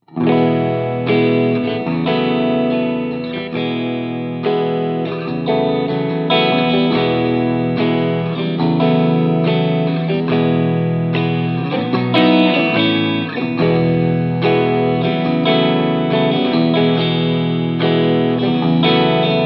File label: r billy, guitars